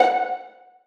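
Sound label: violas pizzicato f#3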